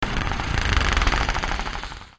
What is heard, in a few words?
hiss